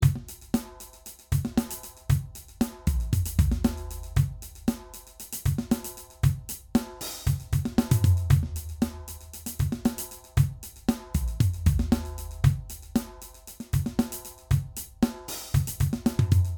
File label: Drum